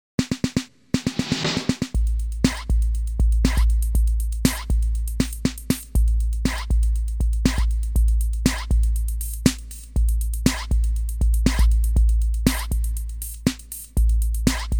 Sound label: Bass Face; Soundzabound Volume 1 Disc 2